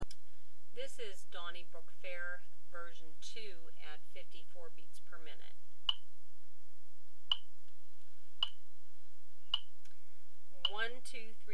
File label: Donnybrook Fair 54bpm, mandolin